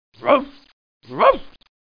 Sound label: Ruff; Boomer